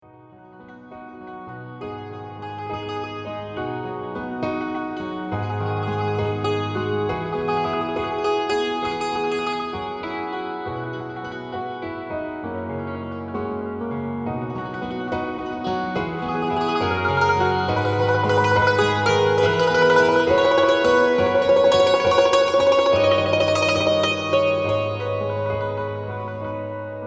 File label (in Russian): cymbaly